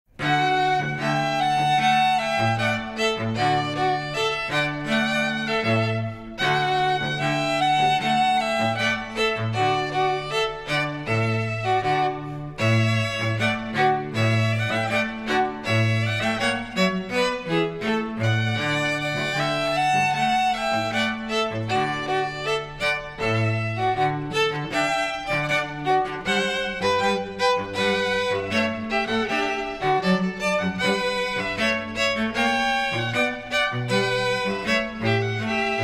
trio ode to joy

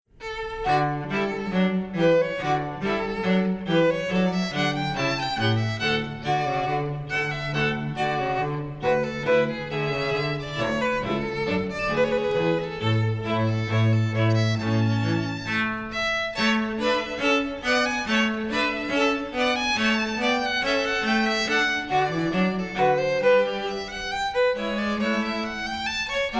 trio march

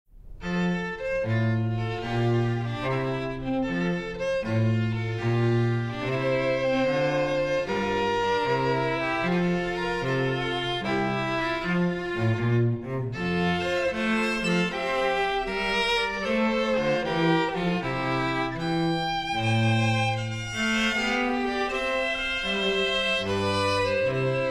trio air; handel

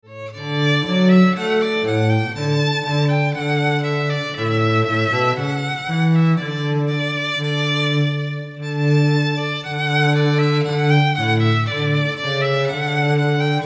simple gifts, duo

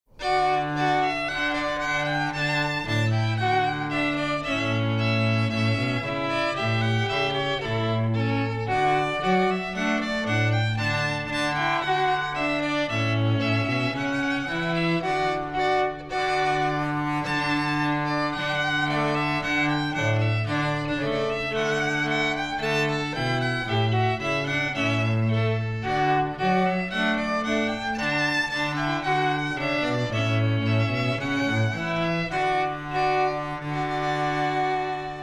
trio; simple gifts

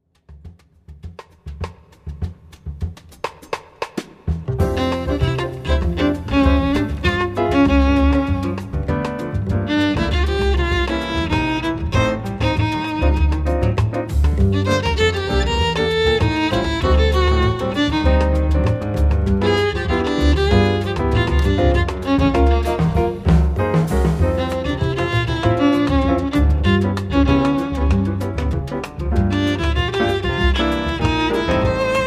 jazz

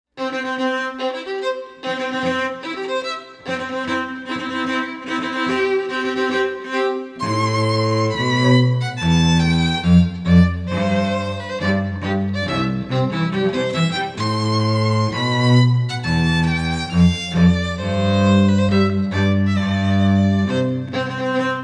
wedding march, duo

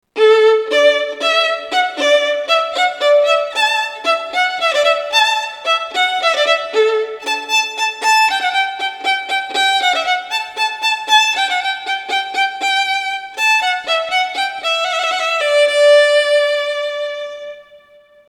Violin Hornpipe; solo

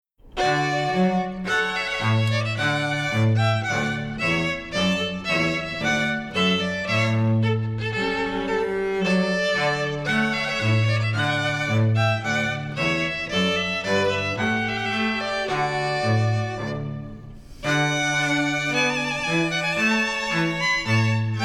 trio trumpet voluntary

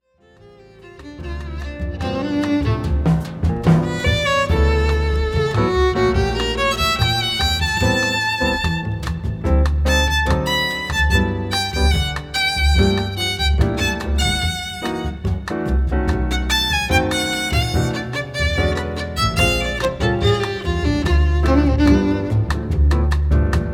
jazz

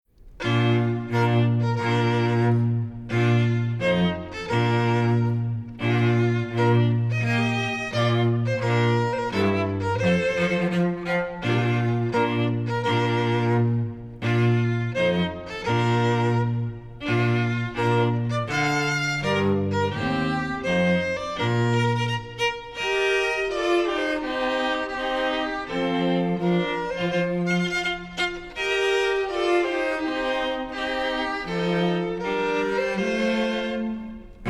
trio bridal march

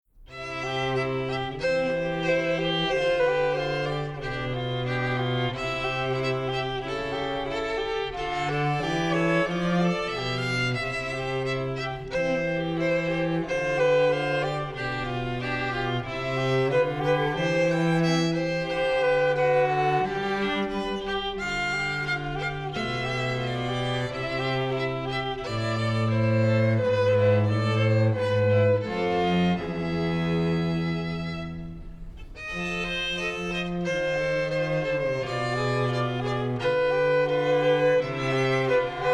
trio andante

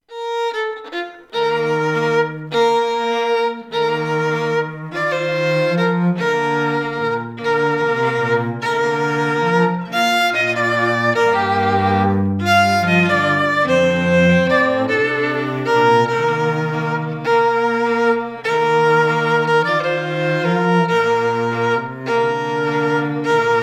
trio

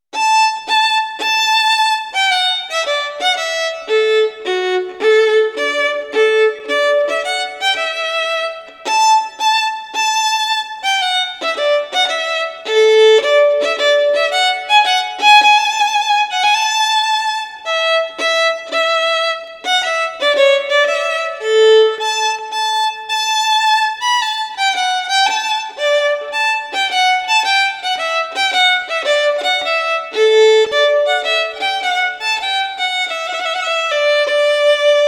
Violin; Trumpet Tune

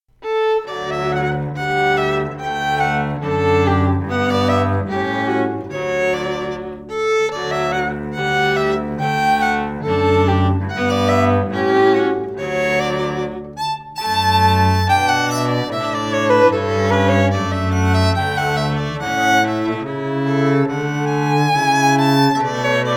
TRIO; sleepers awake

